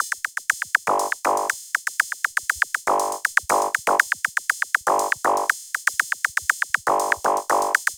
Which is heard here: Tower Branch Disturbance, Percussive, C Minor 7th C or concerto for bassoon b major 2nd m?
Percussive